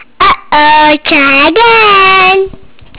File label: wrong